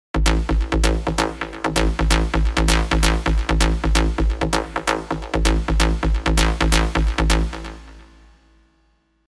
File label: Big Bad Bass, rolling bass; Revealed Serum 2 Rolling Bass Vol. 1